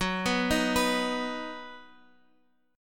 B Major Gb

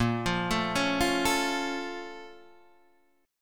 Bb Minor Major 7th, Guitar, Open D

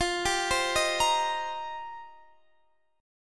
F 7th Suspended 2nd Suspended 4th, Guitar DADGAD; F7sus2sus4; arpeggiated; x,20,17,17,18,20

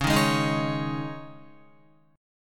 Db Suspended 2nd Guitar 4E,4A,5D,5G,5C,6F; strummed; Dbsus2; JGuitar

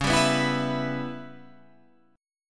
C sharp Minor 7th Flat 5th C sharp Guitar Open F; C#m7b5; strummed; 13,14,11,11,14,11

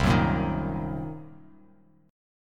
C Minor 7th Flat 5th, C, down, chord; strummed; Cm7b5 chord diagram.; JGuitar

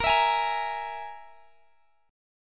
E Major Flat 5th

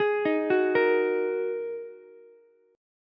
A sharp 7th Suspended 4th Sharp 5th down Ukulele; arpeggiated; JGuitar

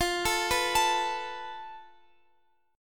F Major Flat 5th, Guitar, Open F; FMb5; x,x,17,16,14,16; arpeggiated

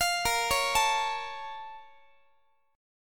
A sharp Minor Major 7th, down, Guitar; A#mM7 arpeggiated; JGuitar

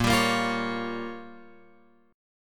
A sharp Minor 6th A sharp, Guitar Open F; x,5,7,5,8,8; strummed; JGuitar

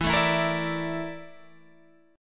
A sharp Major 7th Flat 5th E

